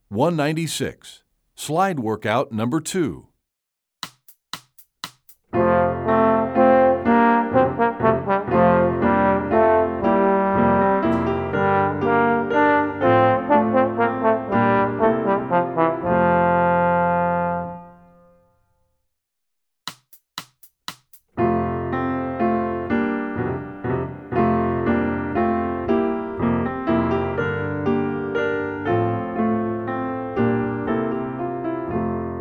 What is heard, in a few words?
Slide Work-Out, trombone; essential elements